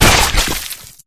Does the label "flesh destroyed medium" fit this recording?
Yes